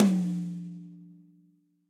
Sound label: Tom High Dry; Drums; Drum Hits; Session; Ableton; Session Drums Studio; MPE